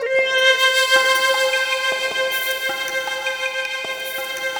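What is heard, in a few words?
wave sequence, synthesizer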